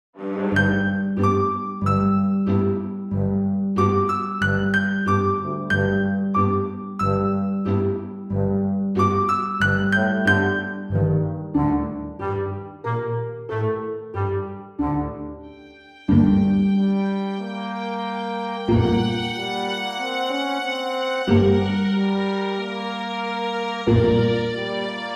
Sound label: Quirky